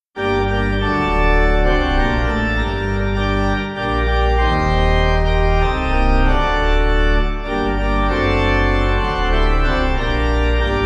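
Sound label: pipe organ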